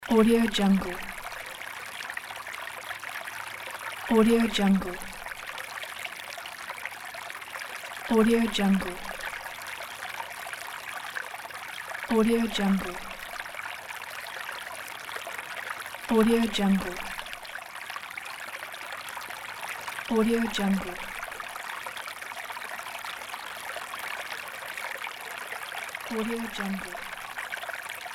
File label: a babbling brook loop